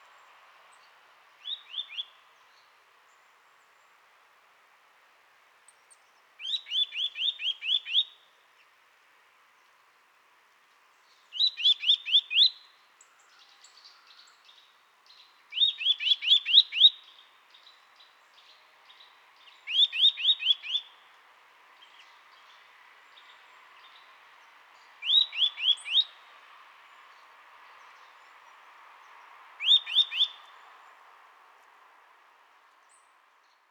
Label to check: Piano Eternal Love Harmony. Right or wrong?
Wrong